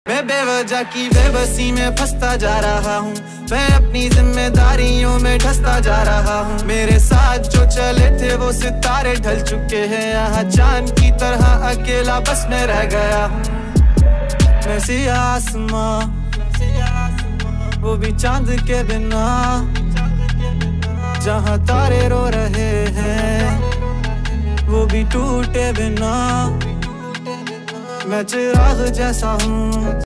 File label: hindi rap ringtone